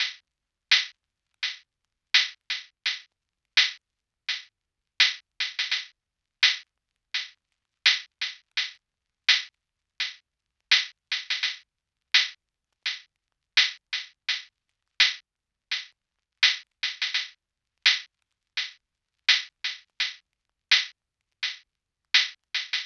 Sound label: HiHat Cm 84 BPM